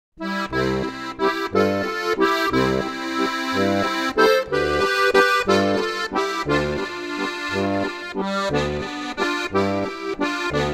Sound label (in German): Walzer; Hechtsee